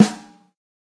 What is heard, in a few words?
noteblock snare; mesecons